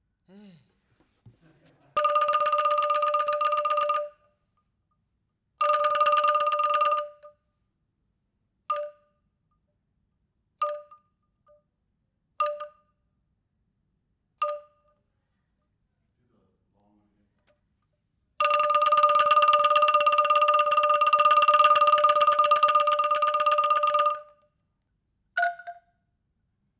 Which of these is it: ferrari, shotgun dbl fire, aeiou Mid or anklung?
anklung